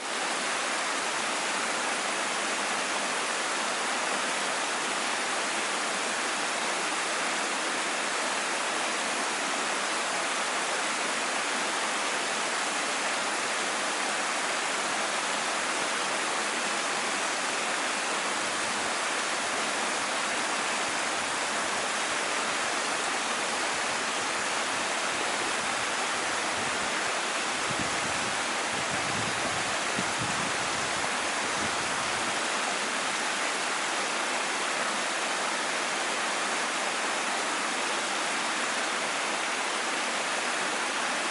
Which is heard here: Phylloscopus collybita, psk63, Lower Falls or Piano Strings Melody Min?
Lower Falls